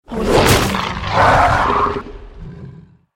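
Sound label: werewolf strikes a blow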